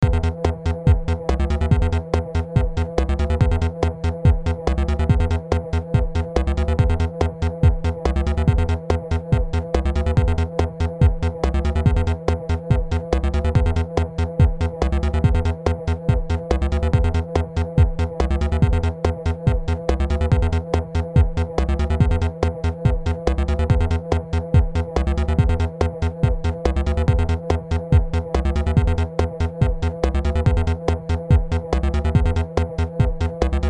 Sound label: Level Complete; super collapse ii